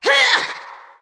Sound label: Creature Attack Light; Fjola